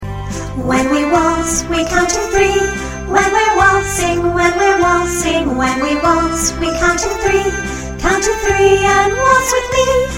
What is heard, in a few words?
Rhythm March Waltz